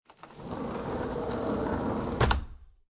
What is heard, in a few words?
Door Close Sliding Glass